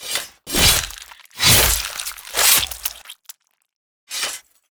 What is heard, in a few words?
kill; Aragami